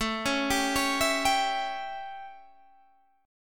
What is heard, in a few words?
A 7th Guitar Open G, chord; 19,18,17,18,17,17